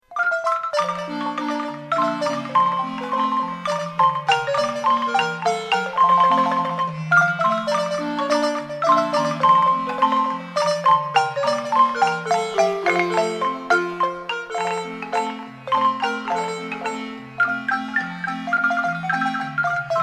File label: marimba